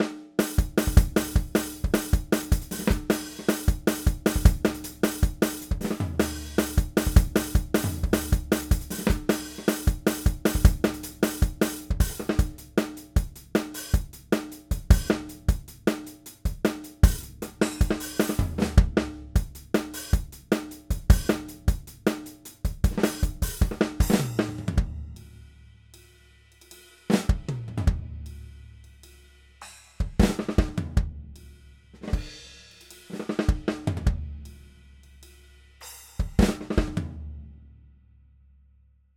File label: GARAGE ROCK DRUMS DRY